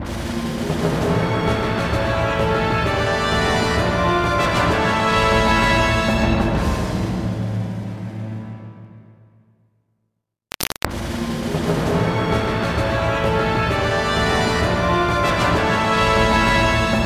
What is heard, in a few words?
Cup Win; super mario strikers gamecube; Mushroom Cup